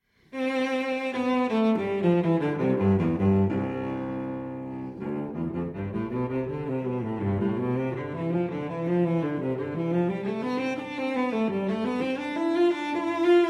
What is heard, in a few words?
Cello Suite in C Minor Prélude; Cello Suite No. 5 in C Minor, BWV 1011